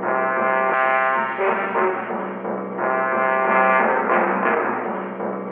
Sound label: horns, jazz loops